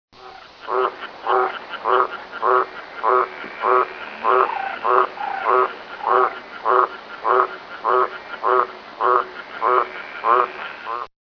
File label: green tree frog